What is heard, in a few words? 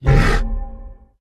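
bear stun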